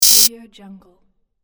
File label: game electric sound